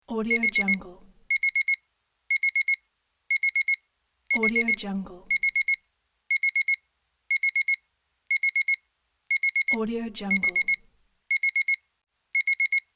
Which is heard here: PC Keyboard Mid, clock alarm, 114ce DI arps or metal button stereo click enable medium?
clock alarm